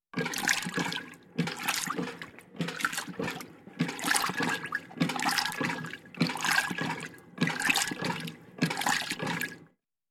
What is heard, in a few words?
Toilet Pump